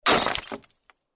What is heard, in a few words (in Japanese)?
ガラスが割れる音